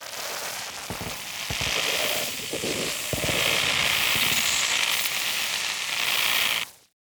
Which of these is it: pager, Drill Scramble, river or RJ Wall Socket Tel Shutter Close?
Drill Scramble